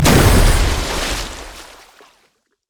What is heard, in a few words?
hydroblast; nami base